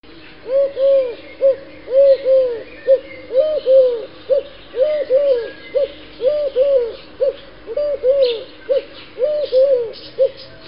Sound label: A Real Bird